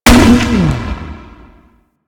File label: Skiff Missile Explosion; Speeder